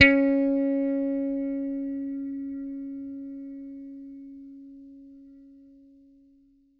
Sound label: GOOD 80 PLUCK C#4, bass; Bass Mace; DirectWave